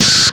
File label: SCREAMFIRE, PHUZZ DRUMS; Spectrasonic Distorted Reality 1